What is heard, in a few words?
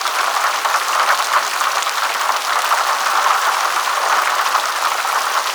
Shipyards Rain Hard Surface; Exterior; Warframe; Grineer Shipyards